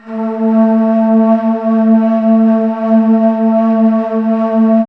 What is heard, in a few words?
BAMBOO; E-Lab; X Static Goldmine 1